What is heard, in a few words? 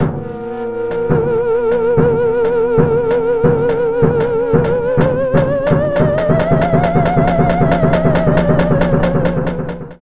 Spooky Fanfare; Ganbare Goemon; Legend of the Mystical Ninja